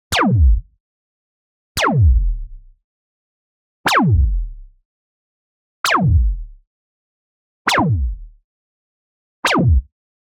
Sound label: LASER Classic Filter Pew; boom library; Anime Essentials